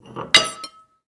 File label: Drop Plate; Game rip; MiSide